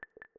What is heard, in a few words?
WiFi Disconnected; Microsoft Lumia-550